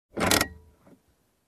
Pulling handbrake

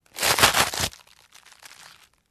Shaking Bag

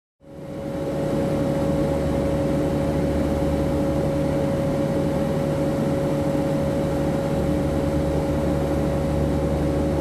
Airliner Cabin Ambience

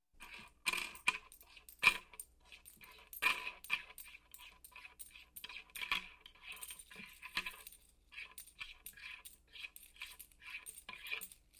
Counting Coins in a box

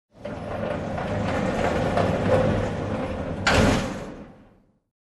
Industrial Sliding Doors close

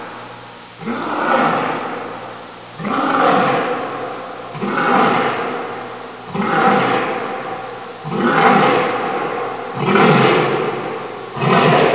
jungle leopard grunting

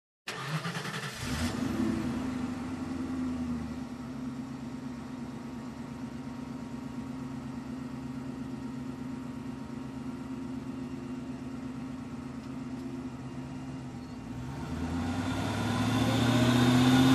Car engine ignition and driving fast

